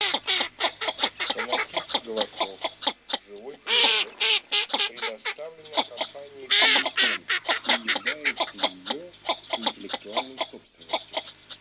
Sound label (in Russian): Широконоска Mestolone; plurifon